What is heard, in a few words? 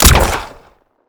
gun silenced sniper shot; Gamemaster Audio; Silenced Gun Sounds